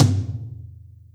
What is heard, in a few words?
drum tom mid